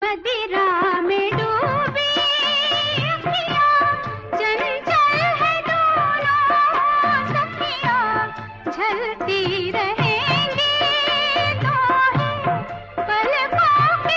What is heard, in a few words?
hindi